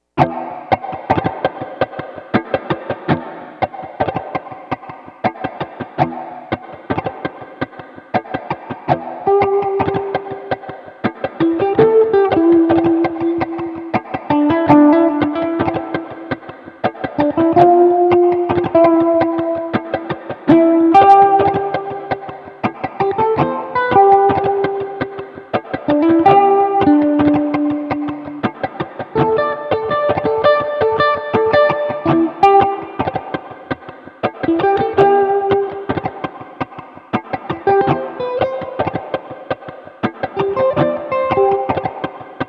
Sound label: Shamito Loops Ritmoso